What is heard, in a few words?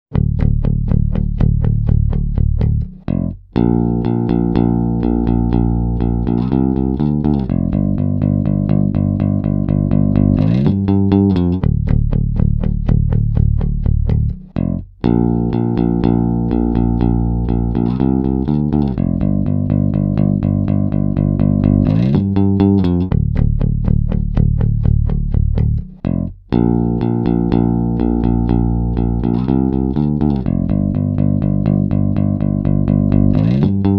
E Bass bypassed